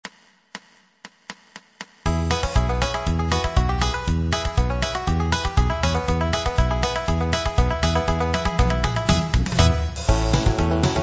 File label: c and w